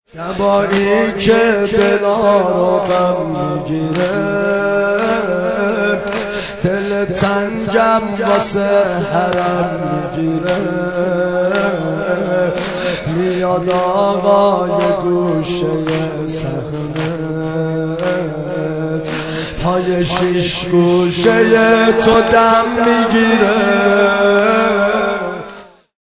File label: madahi ringtone